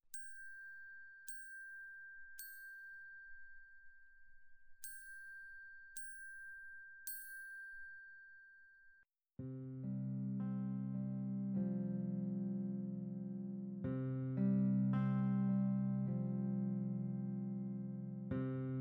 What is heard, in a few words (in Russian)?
Колыбельная гитара вступление